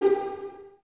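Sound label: violins G4 pizzicato, String Ensembles; Miroslav Vitous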